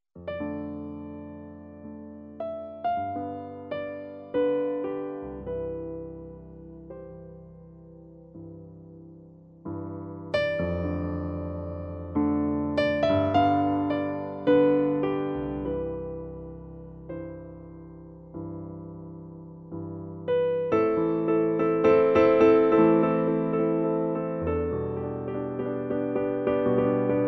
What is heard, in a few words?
Song On White Notes